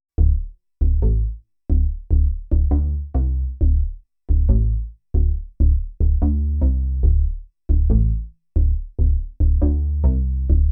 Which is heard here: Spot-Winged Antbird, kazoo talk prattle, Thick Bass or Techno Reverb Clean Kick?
Thick Bass